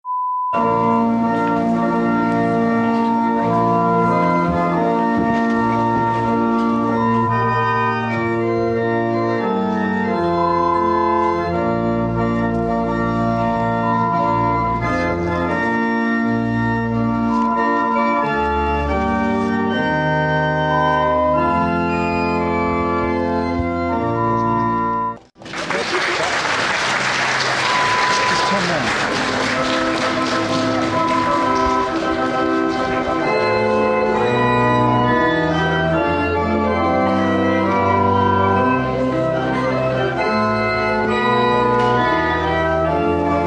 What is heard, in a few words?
Wedding March sound